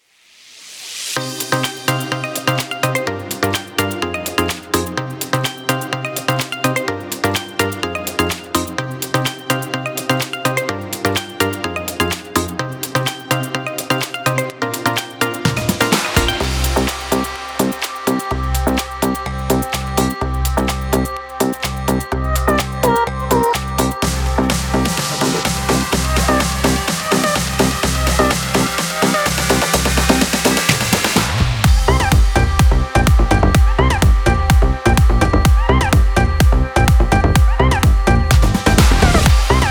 house electronic techno; By Burak Sound Bank; house electronic techno vol 9

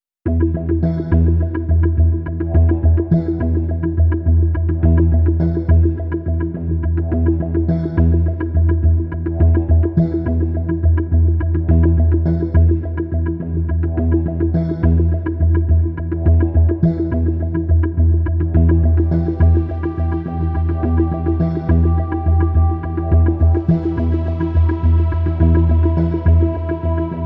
tension; by burak sound bank; tension gerilim vol 34

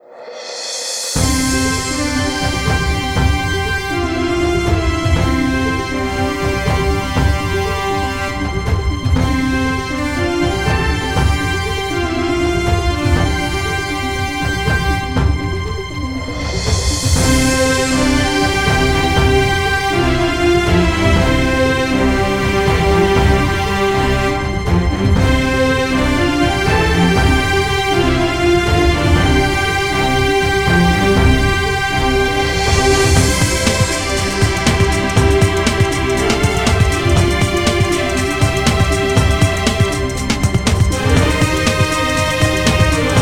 haber, news; By Burak Sound Bank; haberler news

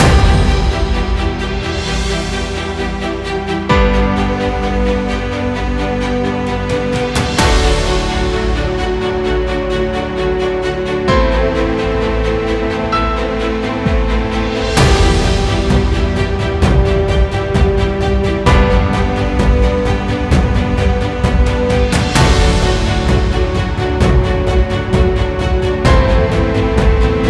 tension balansed; By Burak Sound Bank; tension gerilim vol 16

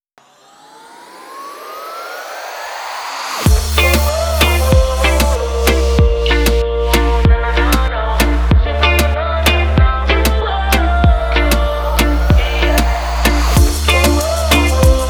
ispanyol latin rageaton; By Burak Sound Bank; latin ispanyol tema vol 2

